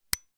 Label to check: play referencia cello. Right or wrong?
Wrong